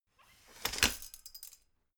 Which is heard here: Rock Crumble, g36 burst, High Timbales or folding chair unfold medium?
folding chair unfold medium